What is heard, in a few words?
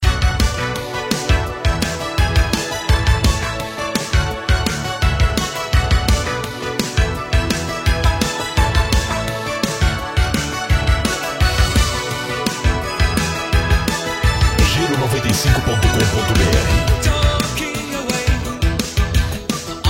Rock 80s